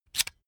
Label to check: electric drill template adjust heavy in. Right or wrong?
Right